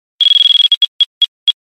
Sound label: Drill loud